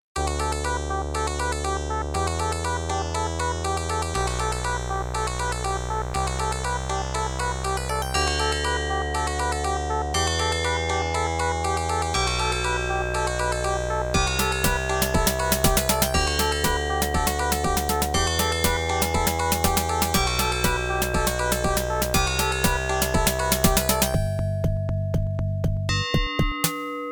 OPL2; Jill of the Jungle

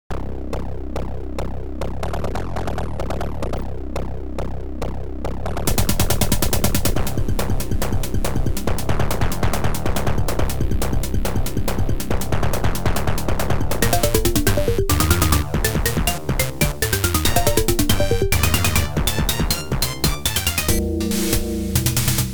ADLIB OPL2

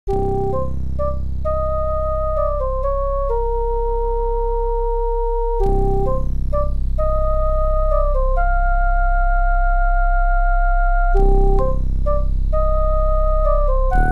OPL3; ultima underworld ii labyrinth of worlds

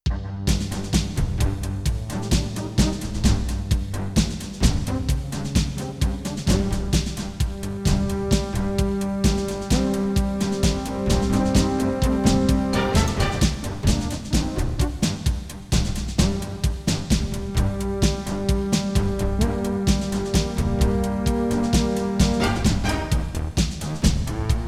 PCI

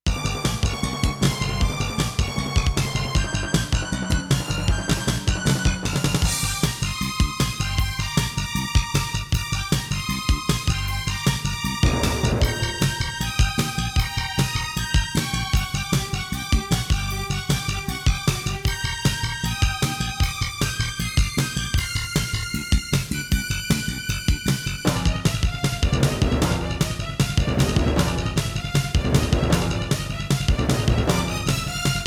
CM500 GM

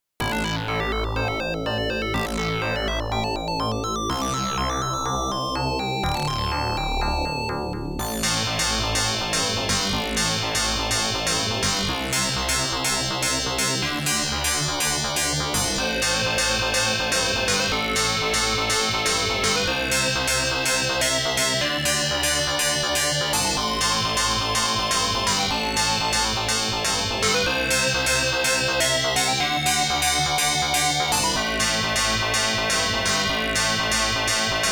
OPL2; Russia

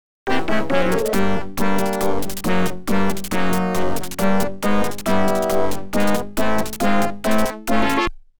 OPL2; Imperial Capital Ship Arrives; X WING

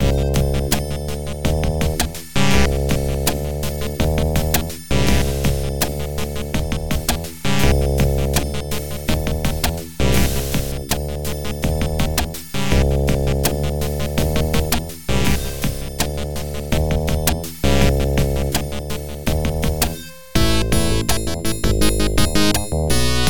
OPL3; DOOM2

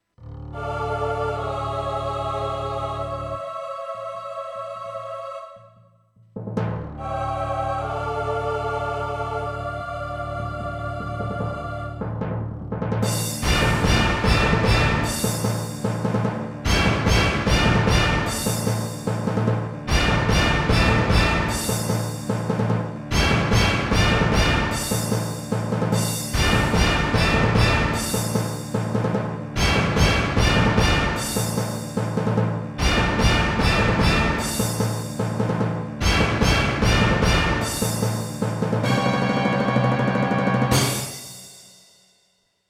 SC880; dune 2 the building of a dynasty

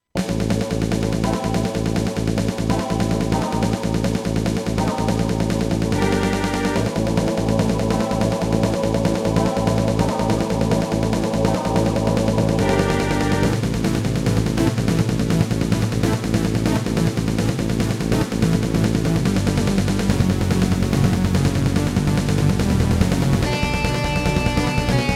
SC880; Dune 2

